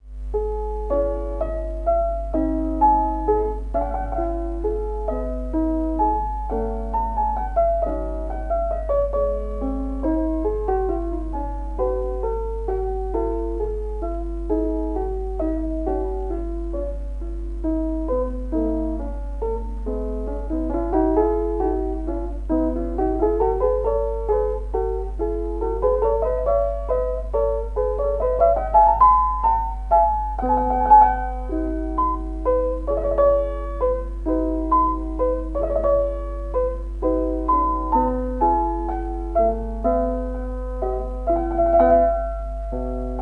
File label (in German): Klavier